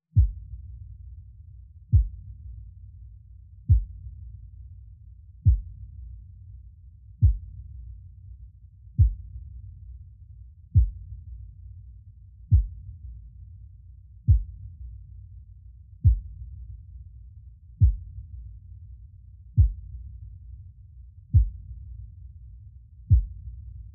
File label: Drums and Bass Mix